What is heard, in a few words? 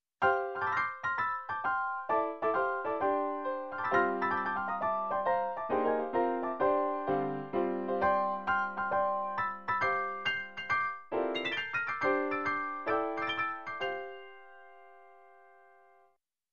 Dissonant Piano Phrase Long